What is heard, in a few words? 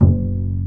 BASS F#2; ORCH.COMP